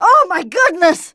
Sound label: hurt; True Crime Streets Of LA; XBOX